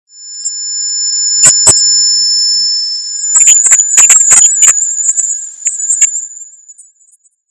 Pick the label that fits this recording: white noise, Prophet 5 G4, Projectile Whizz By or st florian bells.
white noise